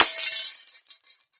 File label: Fluorescent Bulb Cra, housewares